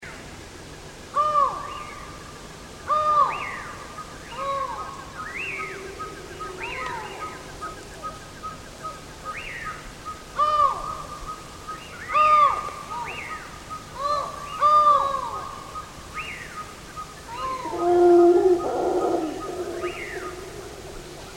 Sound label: collared forest falcon micrastur semitorquatus; bird